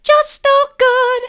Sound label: just no good soft, vocal